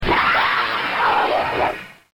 Alien

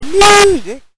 sing

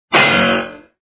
doiiinnnggg

